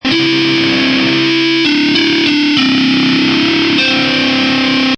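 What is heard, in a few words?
Electric Guitar Short Melody Em 98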